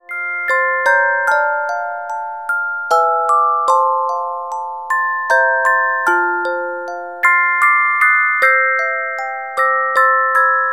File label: Carillon Music Box Melodies